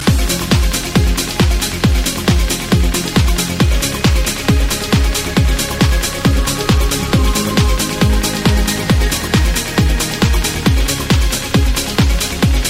Dance-trance

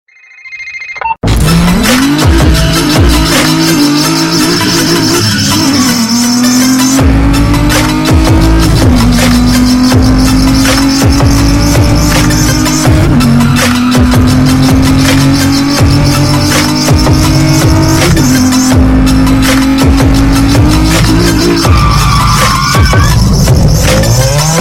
Mega Bass; Nfs

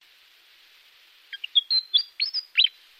Willie Wagtail

